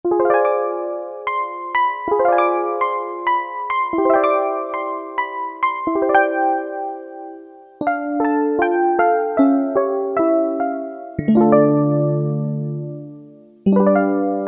Celesta, Korg MSC-4S Orchestra; Orchestra 1

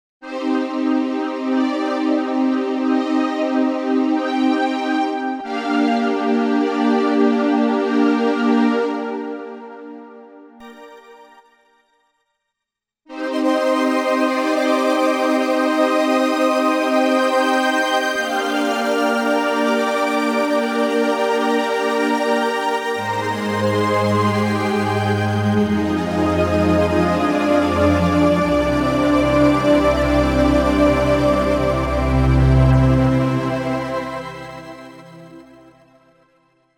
Heavenly Pad

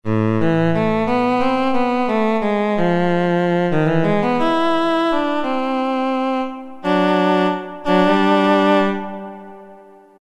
Cello, Roland MT-32

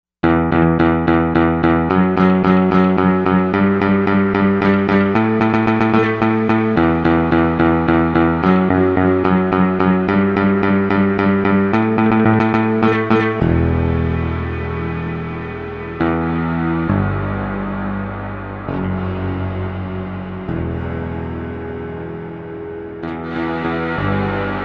Goth Bass

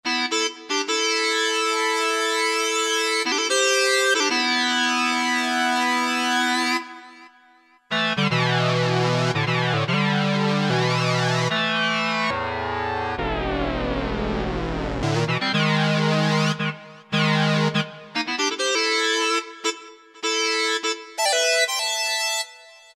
FIFTH SYNC, Akai SG01v

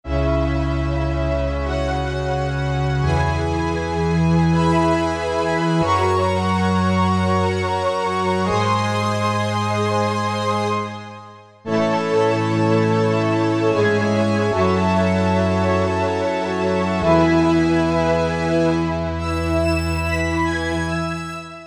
Octave Pad, Korg MSC-12S Synth; Synth 3

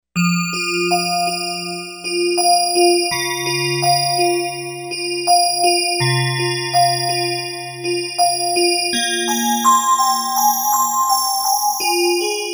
VS Bells

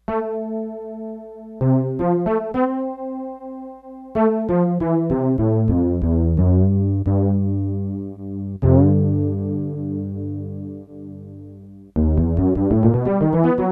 Fretless Bassolo, Roland D-10; Factory Patches

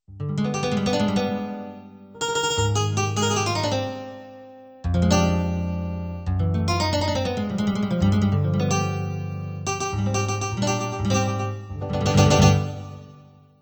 guitar flamenco, Yamaha PSR-530

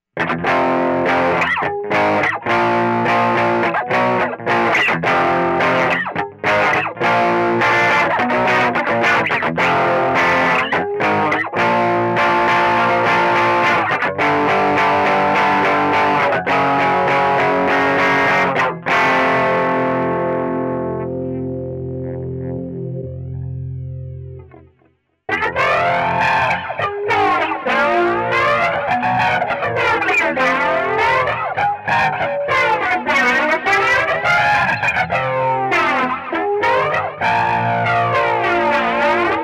Classic Stack, Roland Micro Cube

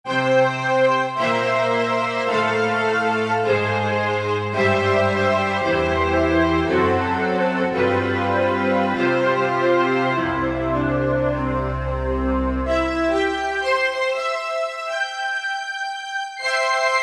Stero Str, Korg MSC-1S; Korg MSC-1S Variety

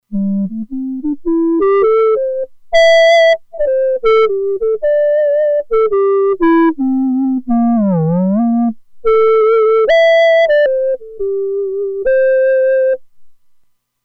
Clarecordr

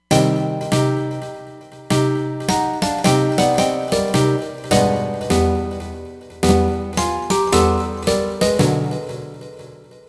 Electro Tango, Roland Fantom XR

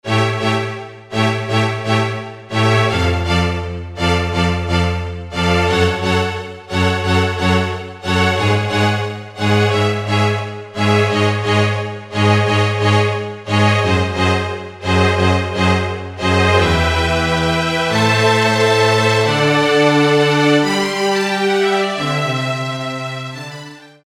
Strings, Roland U-220; Factory Patches

